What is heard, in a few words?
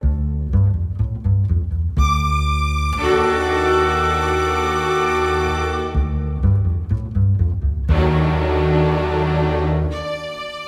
sketches for bass orchestra